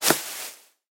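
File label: baseball sounds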